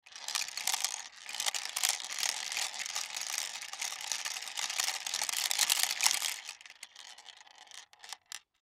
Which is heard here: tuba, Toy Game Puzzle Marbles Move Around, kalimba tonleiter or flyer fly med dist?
Toy Game Puzzle Marbles Move Around